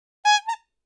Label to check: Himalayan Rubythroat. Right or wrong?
Wrong